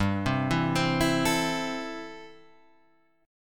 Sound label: A Minor G, guitar